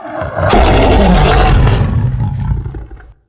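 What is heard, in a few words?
krayt dragon attack; Star Wars Knights Of The Old Republic I; XBOX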